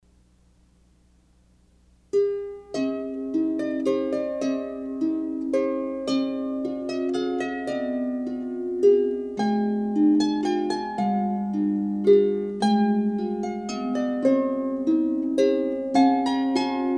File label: rainy day waltz, harp